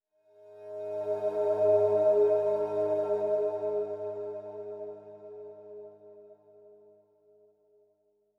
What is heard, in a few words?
Calm Pad; super mario maker 2; Peaceful SFX Raws - Otoasobi